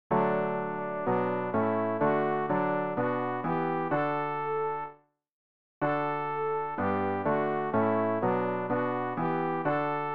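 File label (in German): sopran, Übehilfen; RG 027: O Höchster, deine Gütigkeit; Ref. Gesangbuch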